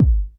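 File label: dance bass drum, dance kit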